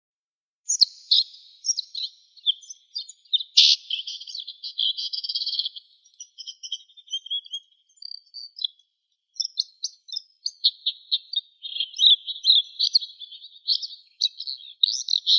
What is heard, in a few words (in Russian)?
zhulan obiknovennii lanius collurio, zvuki prirodi